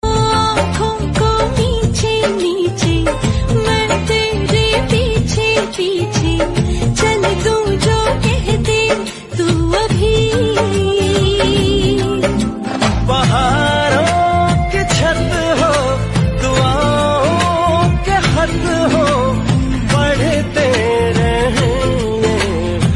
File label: Hindi Song; Tashan